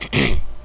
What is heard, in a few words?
Throat; Buggy (PS1, Windows) (gamerip) (1998)